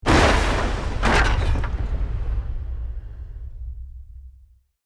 EXPLOSION MEDIUM WOOD

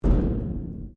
Strike Metal Hollow Tank Soft

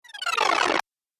Robotic Wind Down; Robot Sound Effects; Sci-Fi Robot Sfx

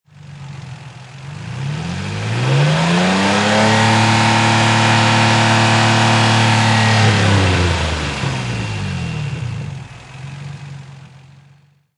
Nissan 350Z Engine Long Rev; Auto, Power Car, High Performance Car Sound: Nissan 350Z (Nissan 350 Z. Engine perspective.

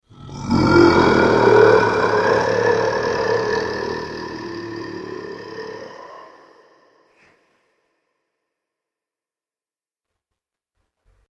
Fear The Mega Monster

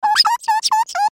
Squeak Faucet, Squeak Faucet Turn Object; Cartoon Sound Design; Blastwave

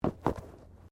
Body movement Foot Foley; Body movement foley to simulate falls and deaths; Footsteps; Human

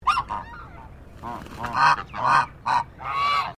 BIRD GEESE CHINESE HONK; Birds from Collingwood Children's Farm; Animals

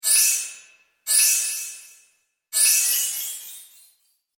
Bright Airy Sparkle Spells, magic

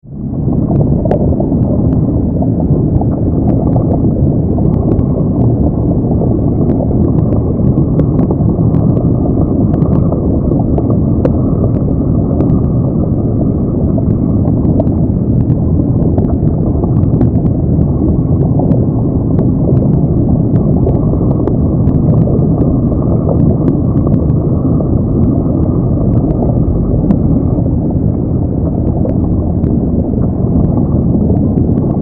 Liquid Vortex, Liquid, Vortex, Horror; 5.1

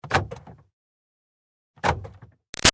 Car Highlander Door Locks; 2005 Toyota Highlander V6; Vehicles; BigRoomSound

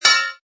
Metal Plate ding; Metallic; Multimedia; Lynne Music

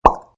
Cartoon Pop, Classic pop sound; Comedy/Cartoon; like pulling out of hole; Funny Sounds

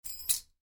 Keys, Pick Up: Grab Keys With Slight Jingle; Household; Miscellaneous Household Sound Effects; Miscellaneous Household (Sound Effects:Household); Stuart Duffield

